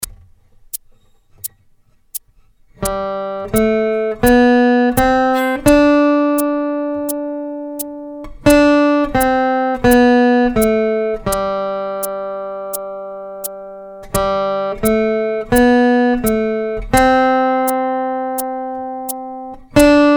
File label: Gum Tree Melody